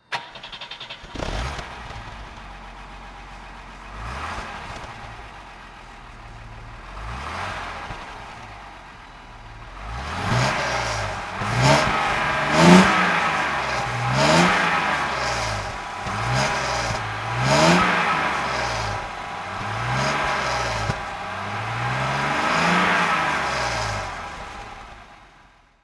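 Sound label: mercedes benz 300 SE throttle; altena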